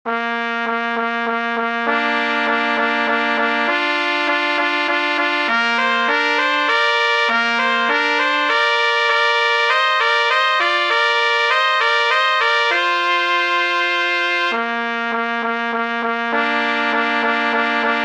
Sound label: menuet; valentine robert